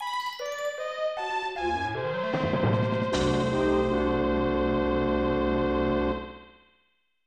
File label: You Failed; Daisenryaku Expert WWII; SNES